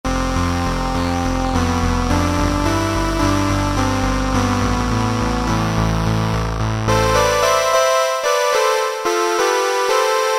syn-303 Love; E-mu Orbit V2; Factory presets

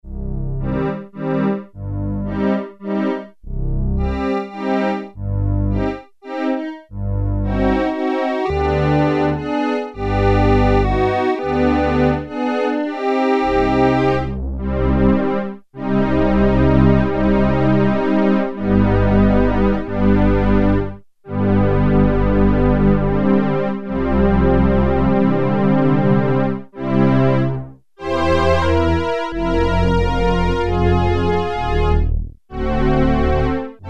Low Strings; Sequential Circuits Prophet-5 (Ver.3); Factory Patch

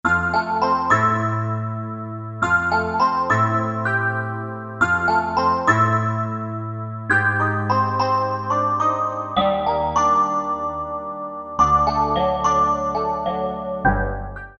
M.Wave, Synth; Synth 1

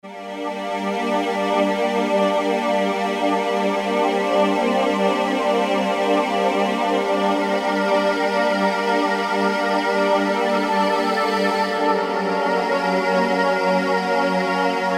Slo Choir, Alesis Z2

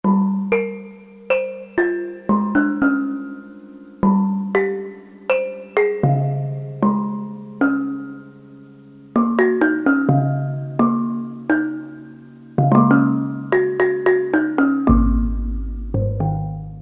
Gamelan, Korg DSS-1

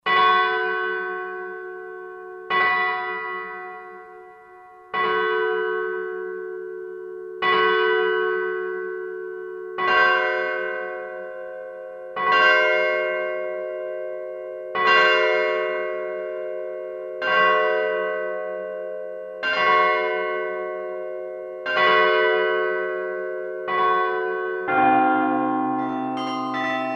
Church Bells, Roland SR-JV80 Orchestral

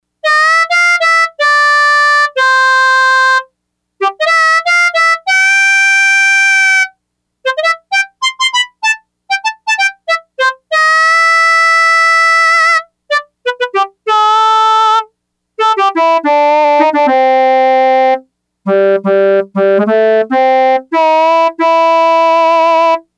Harmosolo, Yamaha DX100; Factory Patches

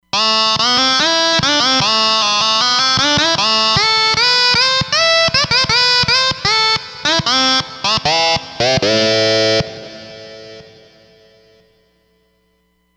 Distorted

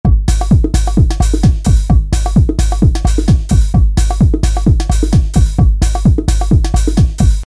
bts-Danzin; E-mu Orbit V2; Factory presets

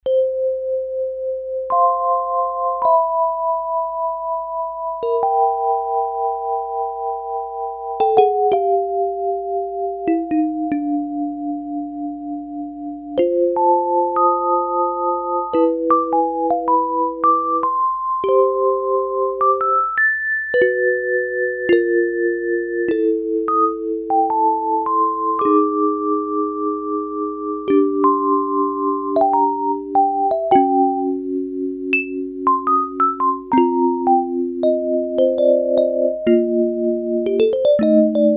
Vibes, Korg DS-8; Factory Patches

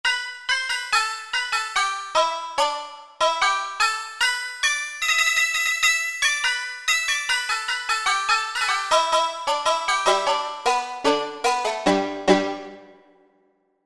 Oriental, Korg MSC-1S; Korg MSC-1S Variety

